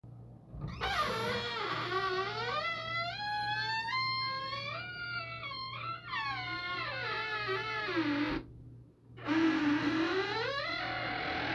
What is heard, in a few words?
door